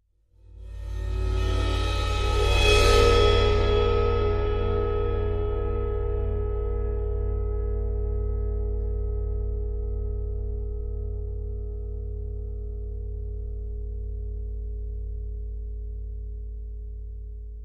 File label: 18 Cymbol Bowed; Sabian 18 Cymbol Bowed 1